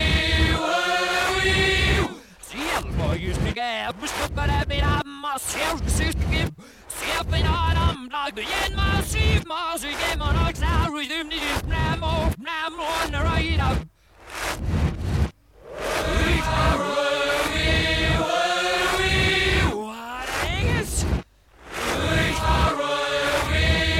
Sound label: Reverse